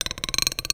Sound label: Bolt Base Rub, Medium Wine Glass